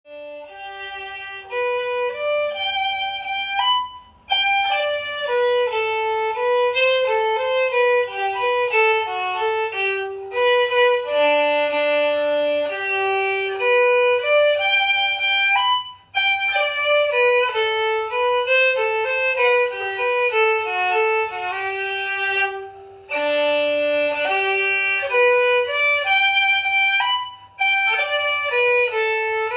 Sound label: polska, munkedal; Polska e. Johan August Nilsson, Munkedal